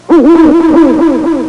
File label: owl